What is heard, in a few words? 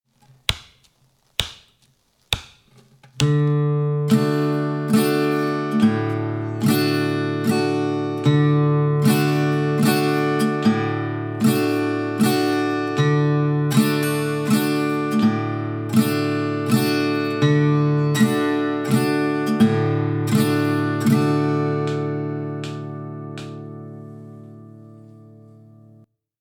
Alternating Bass Waltz Strum